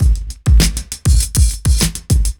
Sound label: chonky, Boombap, Drum loops